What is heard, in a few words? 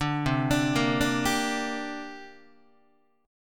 C 7th Suspended 2nd C down Guitar; C7sus2 arpeggiated; JGuitar